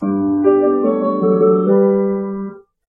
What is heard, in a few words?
logo